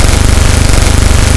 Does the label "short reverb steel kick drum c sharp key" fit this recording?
No